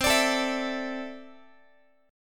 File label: C 6th Add 9th C down Guitar; C6add9 strummed